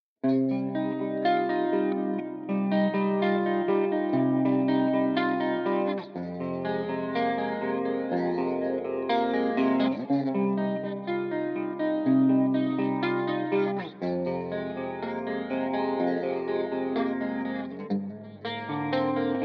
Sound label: Guitar